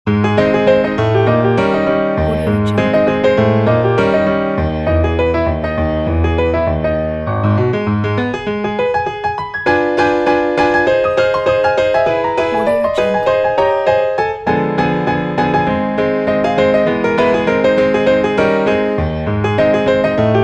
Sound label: western saloon